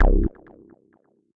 Bass, synth bass; Ableton; Factory Packs

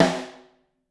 Overhead, Snare; Ableton Foundation FreePacks; Snare Designer

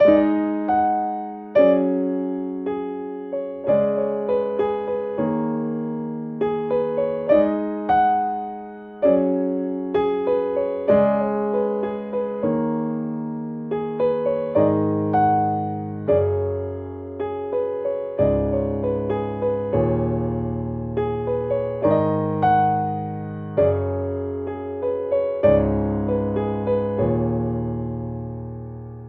Phrase Sombre Blues Ab Minor 66 bpm, Grand Piano; MIDI Clips; Ableton; Factory Packs

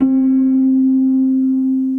Broken Piano, multisampled; Instruments; Broken Keys; Ableton; Factory Packs; Mood Reel

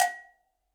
Cowbell Mid Session Dry; Drums; Drum Hits; Standard Hits; Ableton; Session Drums Studio

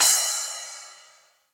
Splash 10 Steely Tight, Cymbal; Drum Hits; Standard Hits; Ableton; Factory Packs; Session Drums Studio

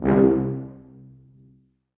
C Tuba Solo Sforzando; Ableton; Orchestral Brass

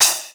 Cym; Drums; Ableton Foundation FreePacks

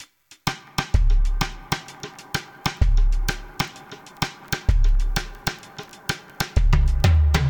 Dubbed Sidestick Old School 128bpm; Ableton; Factory Packs; Session Drums Studio

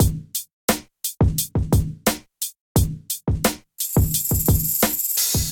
Kit, hip-hop drums; Dex Solar System; Ableton; Golden Era Hip-Hop Drums; Sound Oracle

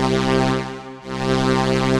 Solina Small Section, String Machines, ARP Solina; Ableton; Factory Packs; Retro Synths

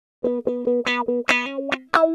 Pop Guitar Wah, C#m, Electric; Pop Rock

